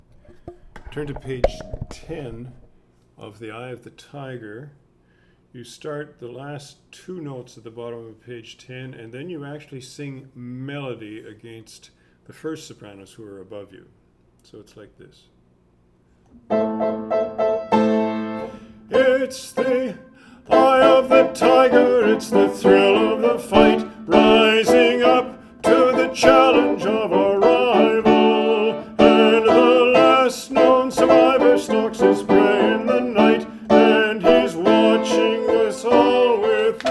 soprano 2

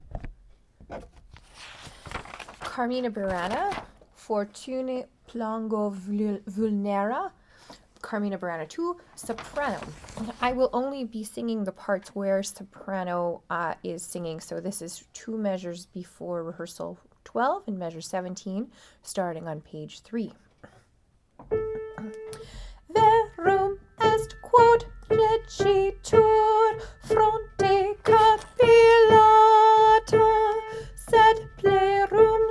fortune plango vulnera soprano

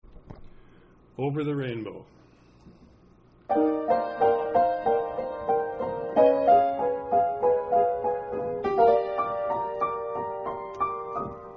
alto

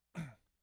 Male Basic

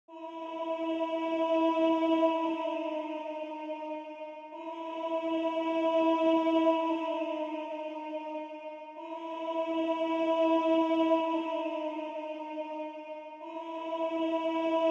tenor

